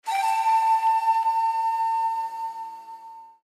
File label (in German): klingelton